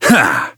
Grunt Attack; Owl; Paladins

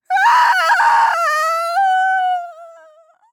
Falling; paladins; ChurchHill

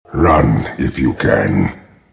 attack; Sand King

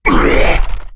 Wound; Thorn; battlerite

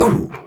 Grunt Attack; Paladins; Owl

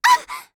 Grunt Hit; Realm Royale; Mage; Starburst Sorceress

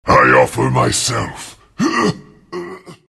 death; Huskar

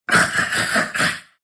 laugh, ru; dota2

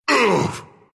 pain, ru; dota2

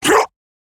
Grunt Jump; Shaman; Paladins

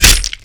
attack; Broodmother

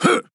Grunt Jump

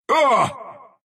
pain ru

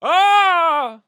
Falling; Paladins; Astro

